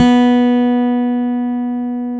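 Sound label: Chapman Stick, bass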